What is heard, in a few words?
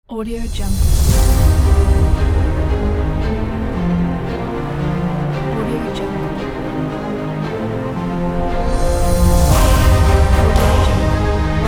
epic hollywood ident